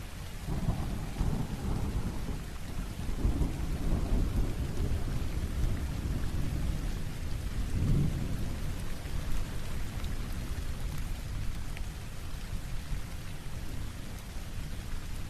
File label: Rain and Thunder